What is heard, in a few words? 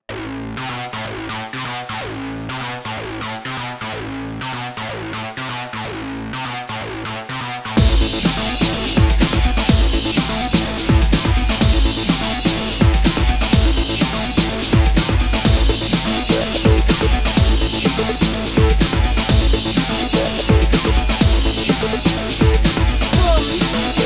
breakbeat